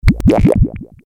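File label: liquid bubbling short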